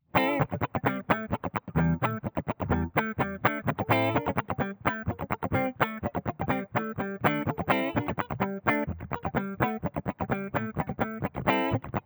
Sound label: clean middle; custom77 cs4